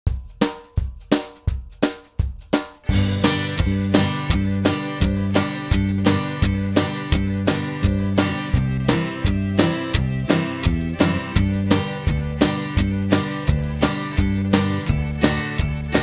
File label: rhythm track; Back Door